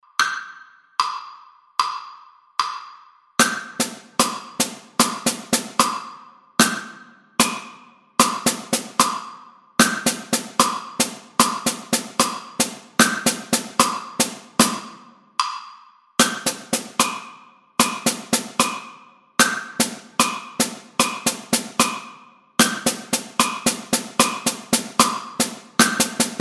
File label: drum set